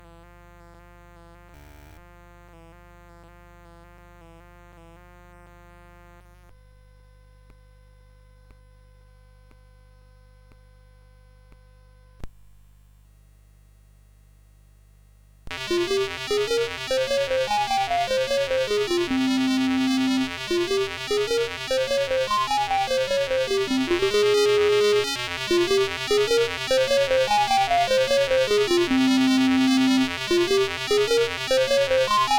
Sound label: Etude Op10 No9 F Minor